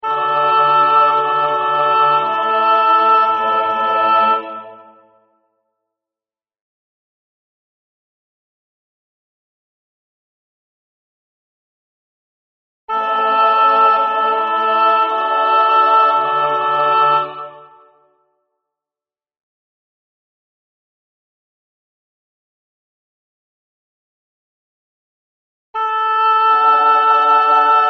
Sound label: Kyrie, Alto; Zelenka; MissaPaschalis